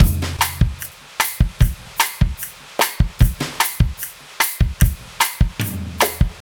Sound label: Brushes at 150 Plain